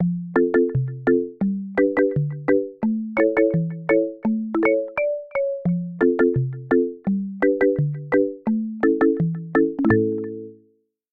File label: alarm ring; INOI 1-Lite; alarms